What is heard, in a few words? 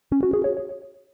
level complete